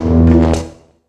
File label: ambience disaster arc